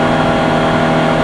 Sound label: Suz med lp, Engines; SuperBike2001